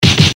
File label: fist hit seq part1